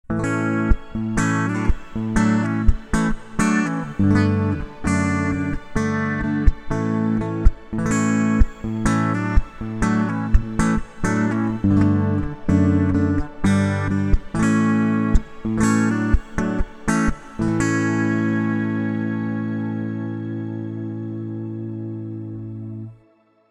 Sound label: Fingerstyle Acoustic